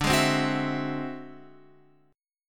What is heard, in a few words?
D 9th Flat 5th D